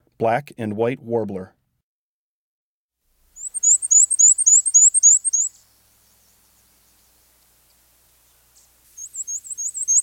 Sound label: Black-and-White Warbler